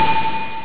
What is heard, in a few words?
clunk